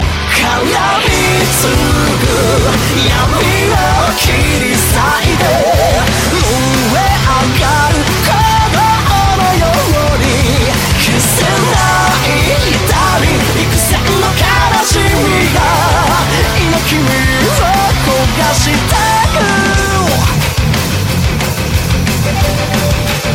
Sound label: Blade Chord